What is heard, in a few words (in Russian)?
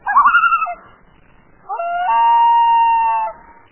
shebet i voj polyarnoj gagari